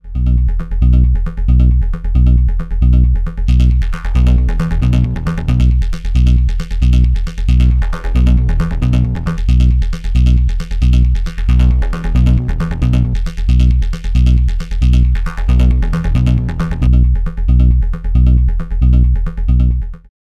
High Gain Mix Filter LFO; Elektron Analog Heat

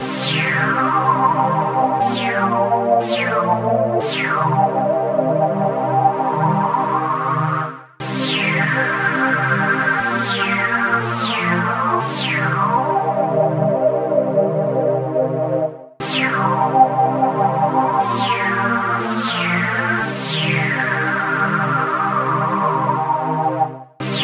Sweep Jupi Dry 120 C

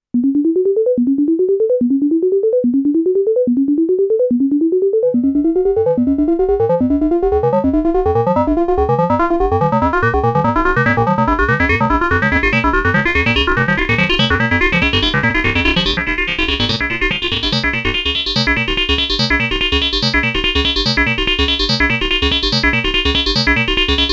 Moog Mother 32 Patch Filter FM

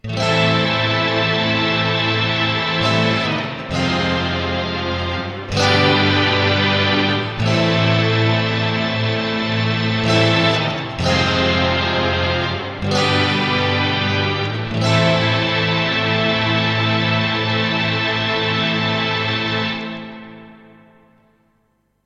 Orchestra plus clean Strat

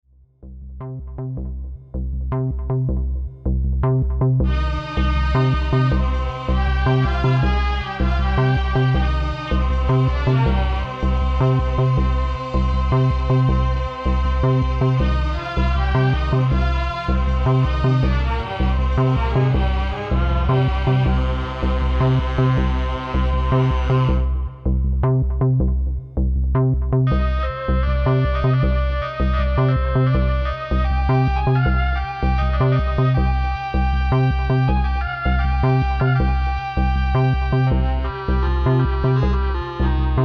Memotron Rack

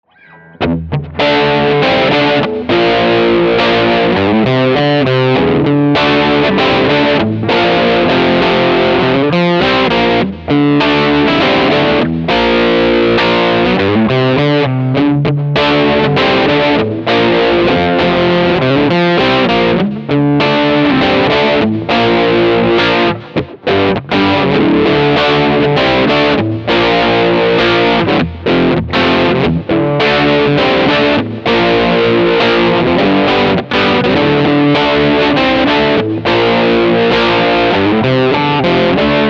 Crunch

